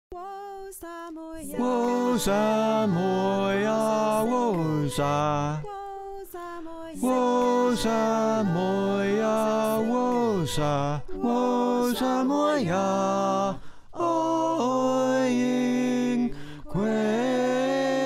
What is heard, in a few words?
Tenor, choir